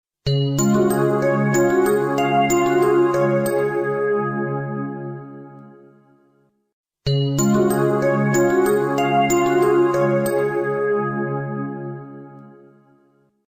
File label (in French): sonnerie collège bodet la plus répandue en France version longue